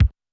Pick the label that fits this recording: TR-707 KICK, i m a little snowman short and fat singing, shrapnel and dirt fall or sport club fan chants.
TR-707 KICK